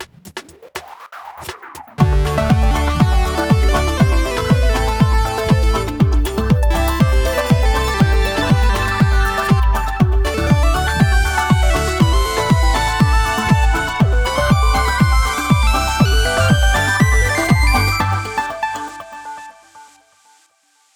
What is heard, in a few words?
Jingle short